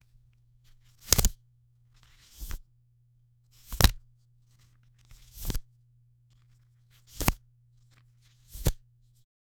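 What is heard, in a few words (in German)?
Karten die umgedreht werden, card game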